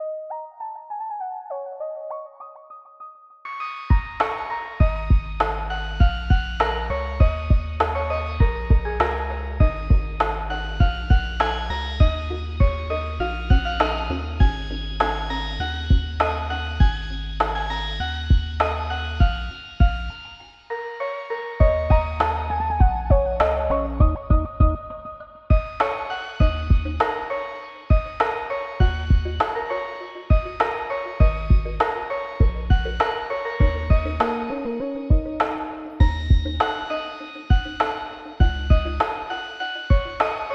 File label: Lo-Fi Remix Anvil Ambience; Pokemon Lo-Fi Megamix 2019